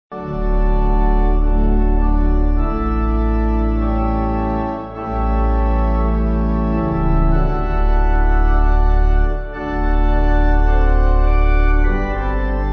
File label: Pipe